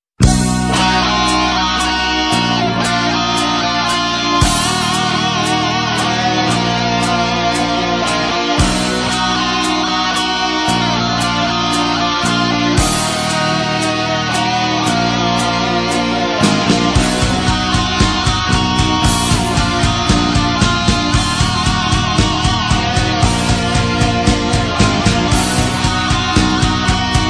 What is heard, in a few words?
Rock Guitar